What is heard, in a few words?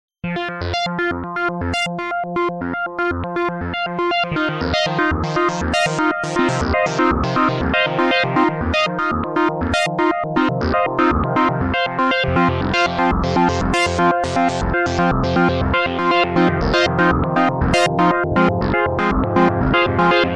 synth; LoopMangler